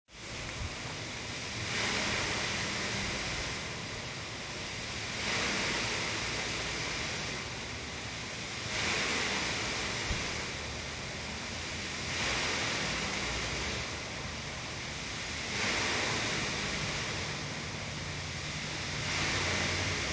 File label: waves on greenway